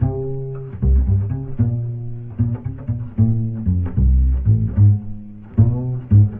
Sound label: bass, Loops